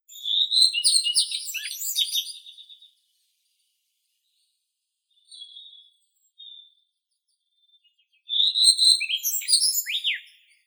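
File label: common redstart, Phoenicurus phoenicurus; gorihvostka obiknovennaya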